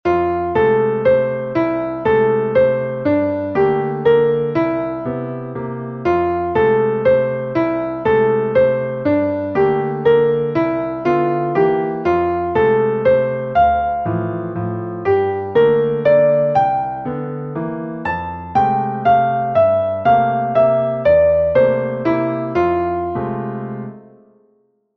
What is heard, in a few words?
Waltz solo